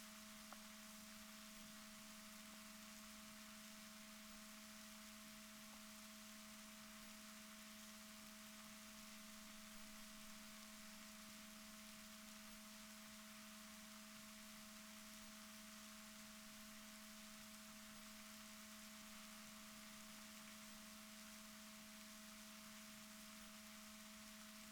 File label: rorquals positif, Balaenoptera Physalus Chile